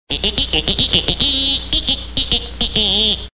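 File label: trumpet voice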